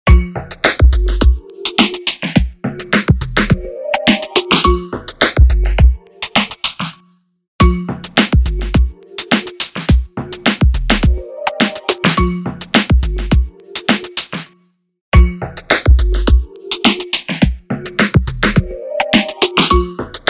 Instant Phaser Mk II Drum Loop Sweep That Mono Drum Room; Eventide